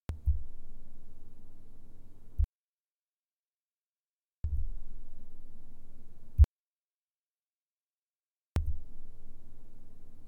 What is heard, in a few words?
hyperx solo cast